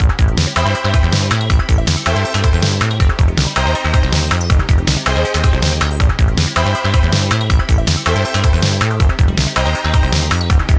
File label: Dungeon